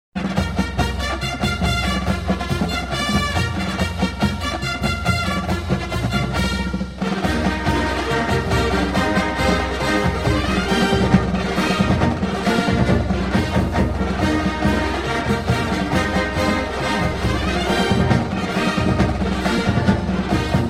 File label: dixie